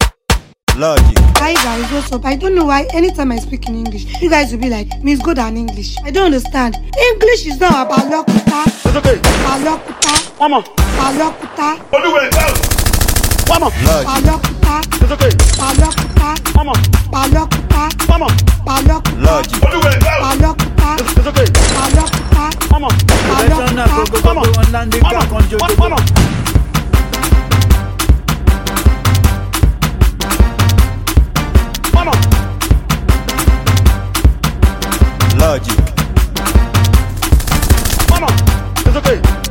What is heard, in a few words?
Professional Beat; Baalokuta